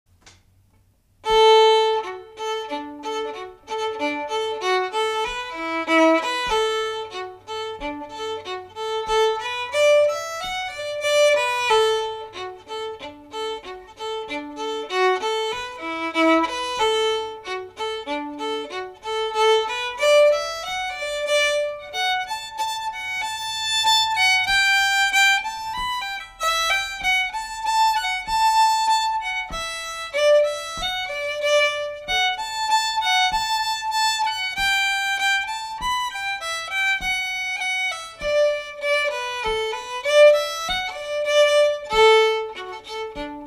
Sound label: slow